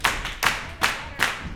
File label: 153 CLP FT, Vocal Blues & Soul CLAP FEET